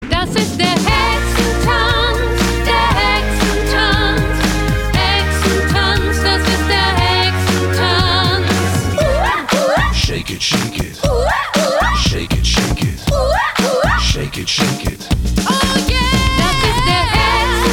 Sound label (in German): Hexentanz mit Gesang